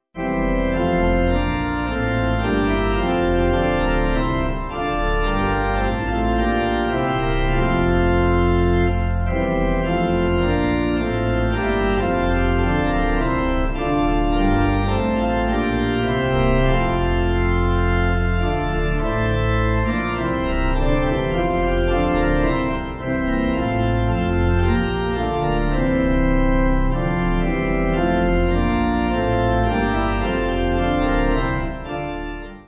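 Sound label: Pipe organ; O Little town of Bethlehem; Forest Green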